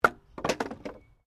Wood Movement Minor; Material components for constructing more complex sounds; Materials

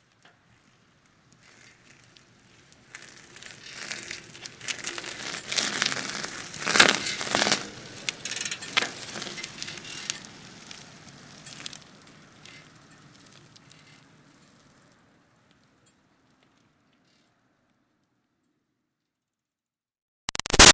Bicycle Retro Cruiser By Crawl Wobbly; Slow Crawl; Pedaling; Vehicles; Bicycles; Big Room Sound

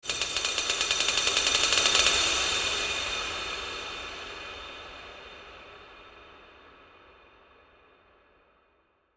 Hit Glass Delay, Hit Glass Delay Reverb; Blastwave

